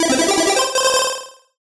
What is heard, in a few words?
MUSIC EFFECT Solo Chip C64 Positive stereo; Deep Dark Fight